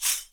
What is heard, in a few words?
TAMBORIM; E-MU Producer Series Vol. 5 – 3-D Audio Collection; 3D Percussives; 3D Ethnics